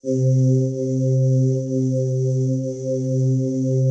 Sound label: PAD, ATMO PADS; Best Service; XX Large